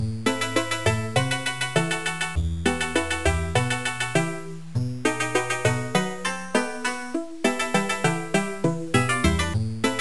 Level 2 (Two Guitars)

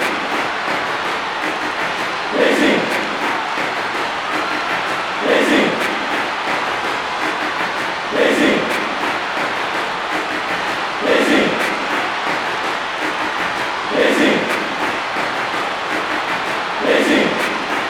Crowd Song; Daisy's Crowd Song; mario strikers charged gamerip

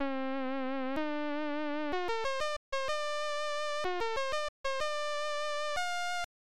Unused Jingle; pro mahjong kiwame; snes

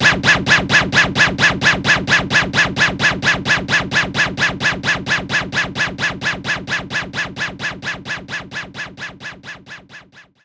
Scratch Pull

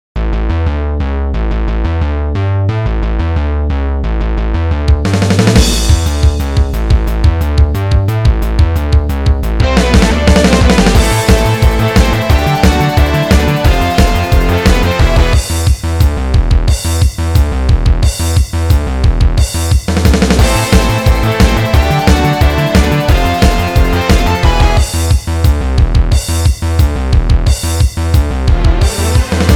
music whirlpool; Dangeon Quest; android game music

